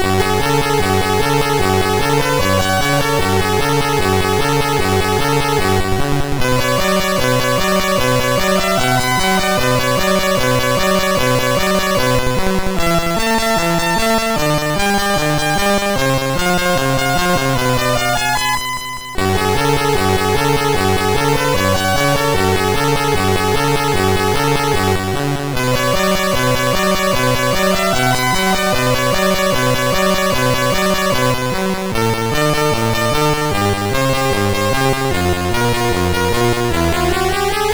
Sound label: Laserwheel sid CSG8580R5